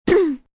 Cough Short Ahem, Cough, 1 child, clearing throat, very short, human voice, kid; Sick; Voice Box